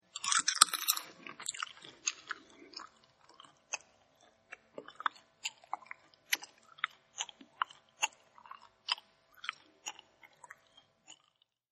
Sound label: Crunch n Chew, Eating; Human; Partners In Rhyme